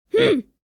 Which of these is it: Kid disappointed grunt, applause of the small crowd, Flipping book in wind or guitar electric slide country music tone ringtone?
Kid disappointed grunt